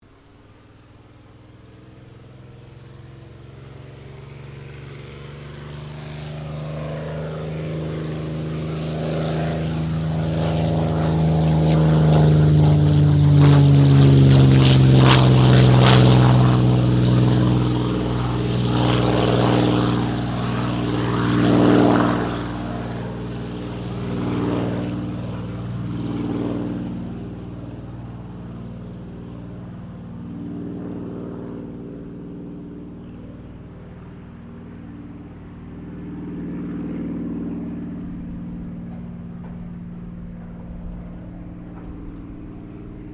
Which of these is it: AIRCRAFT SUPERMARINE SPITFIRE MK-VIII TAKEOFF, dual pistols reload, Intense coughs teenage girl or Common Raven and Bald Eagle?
AIRCRAFT SUPERMARINE SPITFIRE MK-VIII TAKEOFF